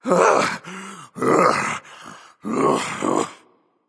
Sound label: breath, voicepack; smite; jorm